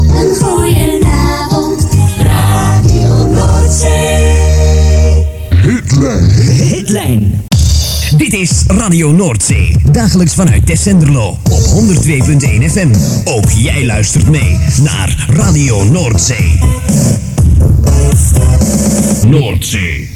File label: jingles; NOORDZEE Tessenderlo